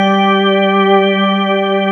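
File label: organ keys G2; D70 KEYS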